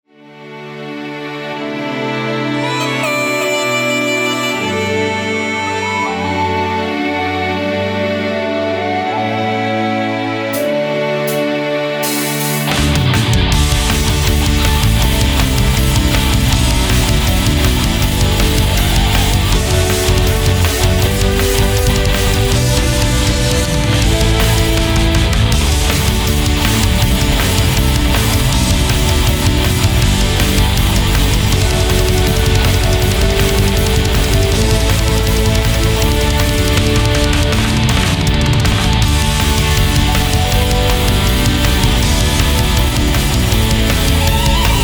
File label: Backing track playback; Bretagne National Anthem Bro Gozh Ma Zadou; Inophis